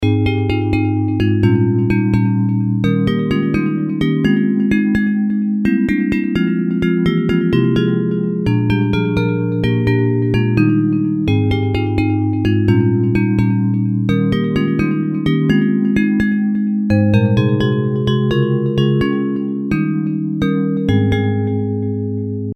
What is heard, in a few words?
Bells